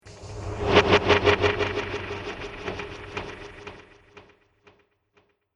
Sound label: Horror Scifi Fx; Sci Fi Sound Effects; Andrew Fleming